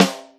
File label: Jazz Snare, Drum Machines Roland R-5